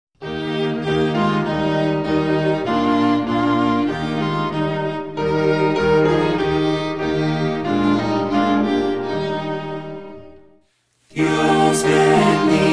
lord dismiss us with thy blessing vocal and instrumental, hymns spanish